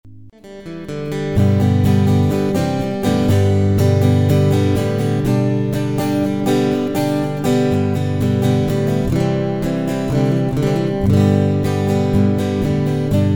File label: Nashville rhythm on the electroCoustic